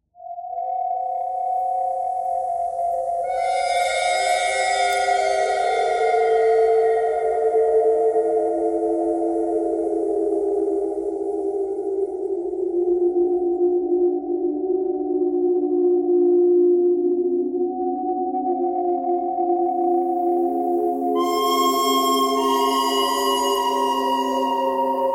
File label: bird cry bird theme thread noise